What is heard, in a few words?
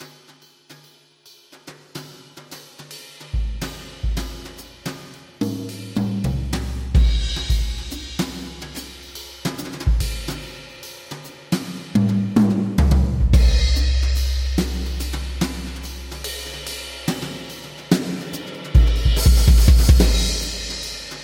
Jazz Drum; jazz club